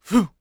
attack voice; UFC Tapout; XBOX

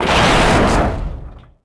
explo sparks machine; impacts explos

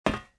metal step, footsteps

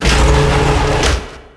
door sliding metal open; Max Payne

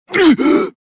gurp; kyle

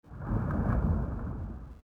bubbles, underwater; Soldier Of Fortune II Double Helix; XBOX; ambience

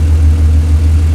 NSX Idle, Engine; Cars; XBOX

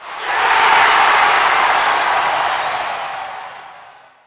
cheer, crowd; MX Unleashed

